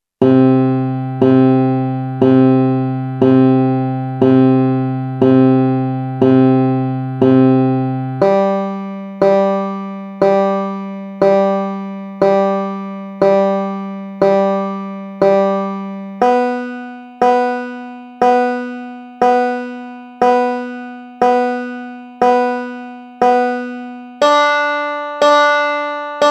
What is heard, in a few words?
Banjo C G B D